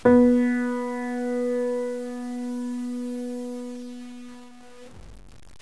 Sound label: piano h